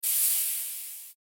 hiss; soundscrate

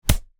Slap; SoundsCrate

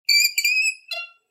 Squeaking; soundscrate

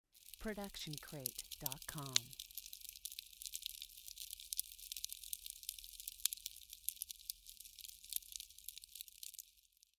Outdoor Small Fire; SoundsCrate

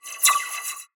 scifi weapon blaster accent multiple

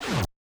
fast fly by; soundscrate

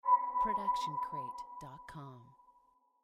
haunting pin drop; soundscrate

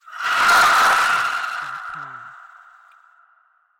distorted screech; SoundsCrate

